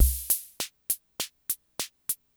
basic rock, retronome; Retronome – A Versatile Analog Drum Machine for My Hammond Organ

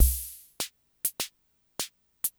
basic swing, retronome; Retronome – A Versatile Analog Drum Machine for My Hammond Organ

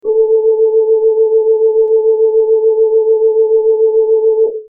rewired vibrato, hammond vibrato; Hammond M-100 Series Vibrato System; Overhauling and Improving the Hammond M-100 Series Vibrato System

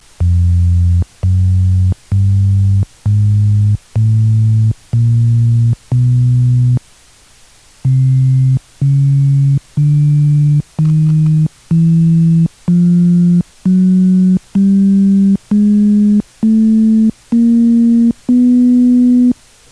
tones, hammond tonewheel; capacitors

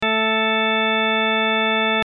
bassviolin, hammond drawbar; Bass Violin 16′

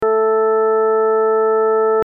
bassviolin tibia, hammond drawbar; Bass Violin 16′ and Tibia 8′; The Science of Hammond Organ Drawbar Registration

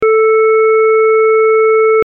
square, hammond drawbar; The Science of Hammond Organ Drawbar Registration

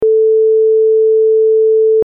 pure sine; The Science of Hammond Organ Drawbar Registration

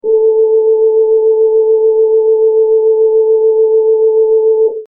rewired vibrato, hammond; Hammond M-100 Series Vibrato System

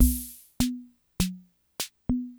basic latin; Retronome – A Versatile Analog Drum Machine for My Hammond Organ

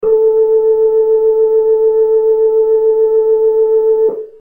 vibrato, Hammond; Hammond M-100 Series Vibrato System; new cap

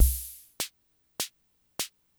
basic, retronome; Retronome – A Versatile Analog Drum Machine for My Hammond Organ

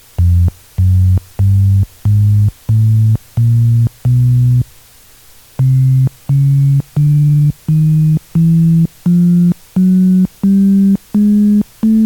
tones, hammond tonewheel; capacitors

